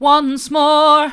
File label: once more hard, vocal